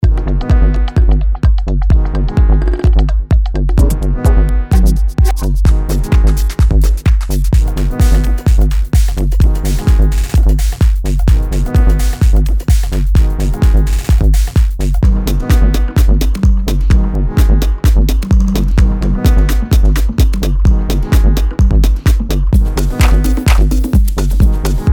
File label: GRID SLICE HOUSE PERC